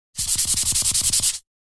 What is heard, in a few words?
eraser board; پاک کردن تخته با تخته پاک کن